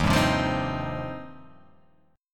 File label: Eb 6th Add 9th, chord; Eb6add9 strummed; x,x,1,0,0,0,2,0; JGuitar